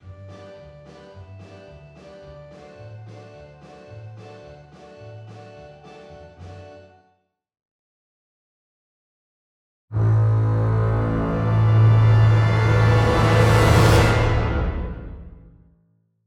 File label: Music; Emergency 5